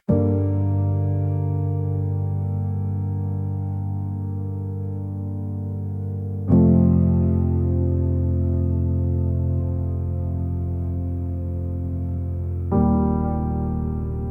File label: serious dark piano for heavy and serious